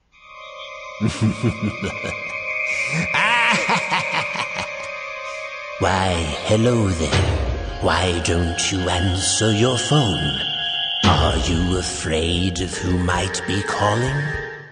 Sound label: Scary Call